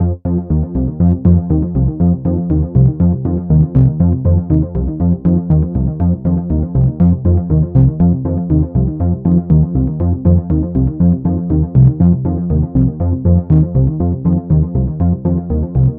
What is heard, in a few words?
First Ping Pong Delay